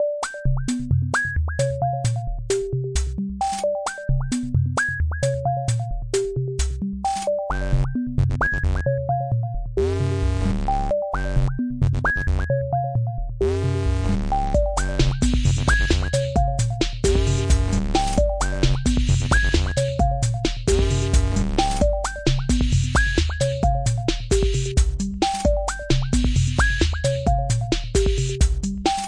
132 shamanic knight bleeps n bass

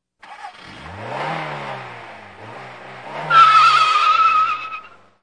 silly peel out; Sound FX Real Life

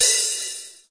open cym; Cymbals; single hits

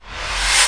electric reverse crash, drums single hits

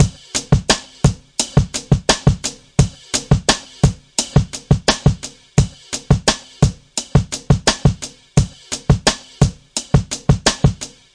086 break; 081 to 090 bpm

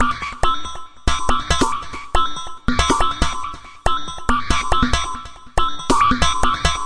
140 hexbeat, DRUM LOOPS and BREAKS

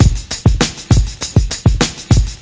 classic brk; VIBRIS CLASSIC BREAKS

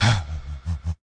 weird wubblebeat, DRUM LOOPS and BREAKS, 195 bpm

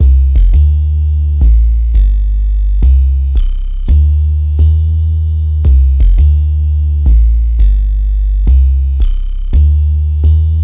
170 double deep synth bass

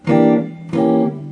mellow jazz guitar, Chords

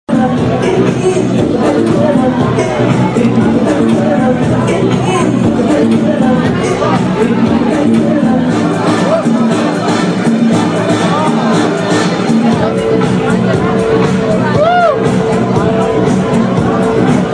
disco party live atmosphere; Sound FX Real Life

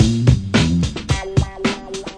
119 bassin break, sampled music loops

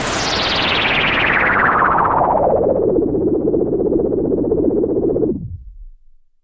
noise, Noise Sweeps

